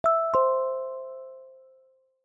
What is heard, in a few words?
Doorbell Haptic; iOS Sounds